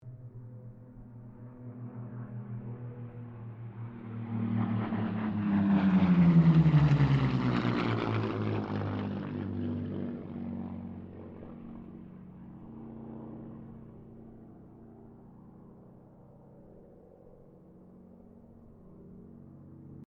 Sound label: Spitfire Mustang Kittyhawk pass; Mixed aircraft squadrons; Airplanes; Vehicles